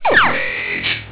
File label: phasers; VitalWatch; mage